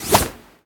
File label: whoosh nylon; Ice Age Scrat's Nutty Adventure